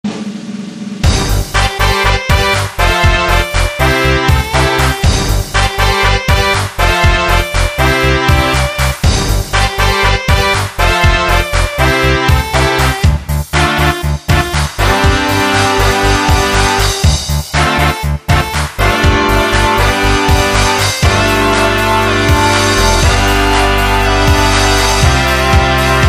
win

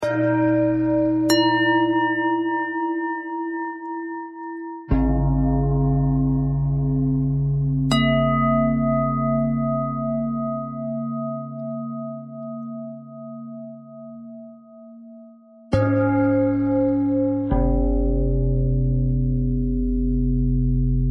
bell threads

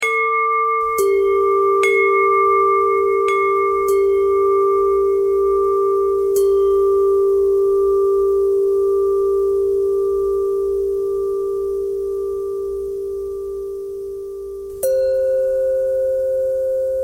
bell thread pentatonic

